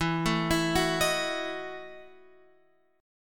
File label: E Major 7th Suspended 2nd Suspended 4th E, Guitar, Open F; EM7sus2sus4 arpeggiated